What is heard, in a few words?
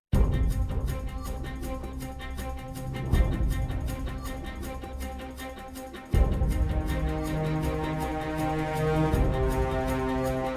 No Choir; Brand X Music